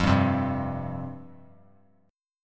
F Suspended 2nd F Bass Standard; Fsus2 strummed; JGuitar

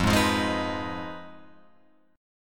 F Minor 7th Flat 5th, Guitar, Open F; Fm7b5; strummed

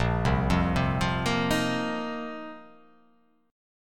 A sharp Suspended 2nd Suspended 4th A sharp

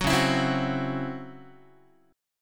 Db Minor Major 7th Double Flat 5th Db down Guitar; DbmM7bb5 strummed

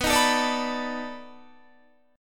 B 7th Sharp 9th B, Guitar; strummed

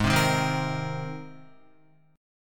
Ab Major Add 9th Ab, Guitar; strummed; 4F,4A,5C,5G,5C,6E; 3,3,3,5,3,6

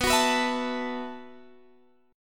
B 7th Suspended 4th B down Guitar Half Step Down

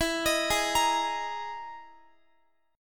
E-Major Sharp 11th E, Guitar; EM#11 arpeggiated

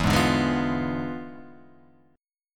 E 7th Flat 9th Guitar Open G, chord

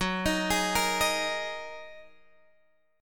Gb 6th Add 9th Gb down Guitar Open G

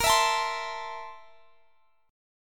A Minor Bb, guitar; Am/Bb; strummed

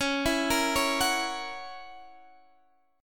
F sharp 7th, guitar

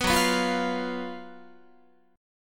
Gb Major 7th Suspended 4th Gb Guitar

